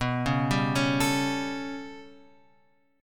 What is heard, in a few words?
B 7th Suspended 2nd Sharp 5th, chord; B7sus2#5 Chord {7 4 5 6 X 5} arpeggiated